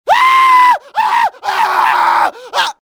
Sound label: scream, pain death, male